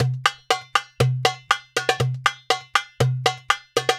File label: Tabla Maqsum 120